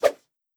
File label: Swoosh Swipe Smooth